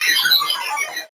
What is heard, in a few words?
VocoBit Noise, 8-bit; musicradar; 8-bit bonanza